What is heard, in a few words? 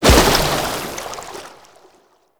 Tidal Wave End; Warframe; Pirate